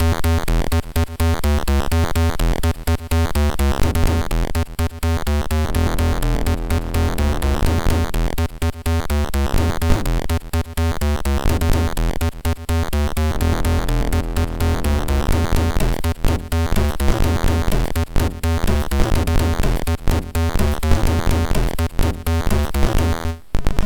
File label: Drums on the Run, MOS6581R2